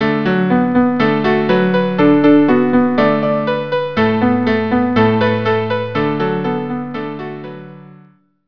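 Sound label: cello piano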